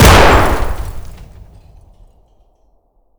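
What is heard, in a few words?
grenade explosion indoor close; Escape from Tarkov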